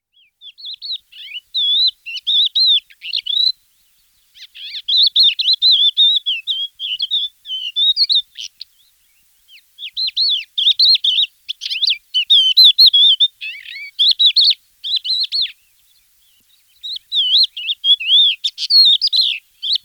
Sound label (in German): haubenlerche, gesang; singvoegel; roche 2004